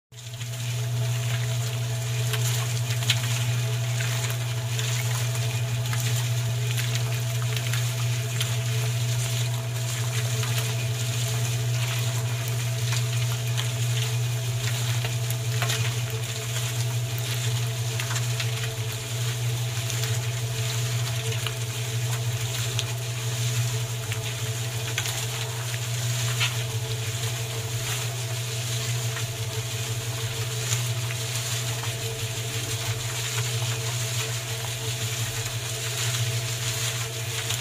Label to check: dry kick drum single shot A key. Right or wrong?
Wrong